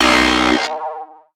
Take Damage; Raypunk

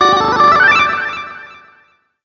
MENU OPEN GAME

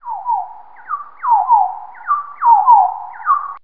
Birds; Buggy; PS1

